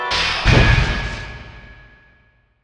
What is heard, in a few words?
Sword Charged Attack